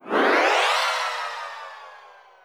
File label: fast spell; Politburo